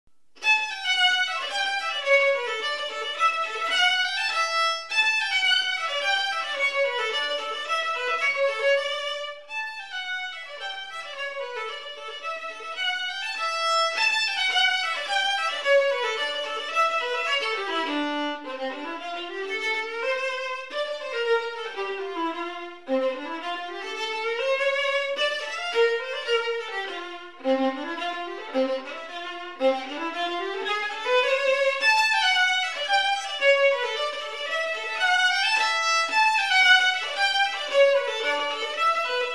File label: Melody, Violin; Fitzpatrick; Violin Melodies